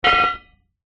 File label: industrial manhole cover close; zapsplat